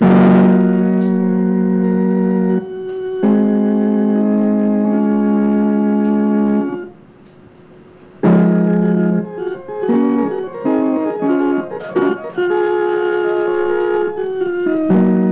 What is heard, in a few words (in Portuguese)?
orgao